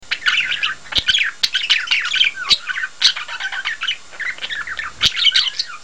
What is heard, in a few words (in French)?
perruche, Musique